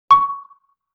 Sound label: Xylophone Single Note C#, Vintage Cartoon Musical Elements